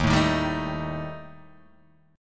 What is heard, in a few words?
Eb Major Flat 5th Eb Guitar DADGAD